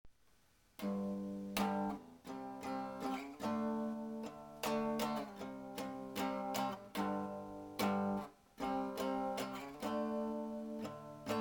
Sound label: riff